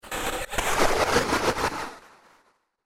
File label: Hydraulic Alien Noise; Spacecraft Sound Effects; science fiction sound effect; star wars / trek style games